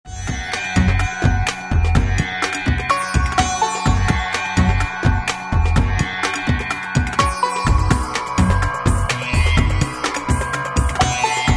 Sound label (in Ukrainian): skrypka vechornyci